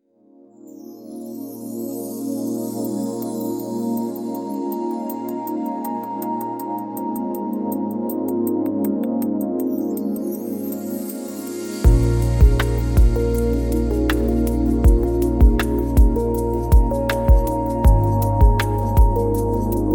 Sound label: smooth electronic chill